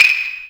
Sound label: INST CLVS, CLAVES; Ragga & Dub Reggae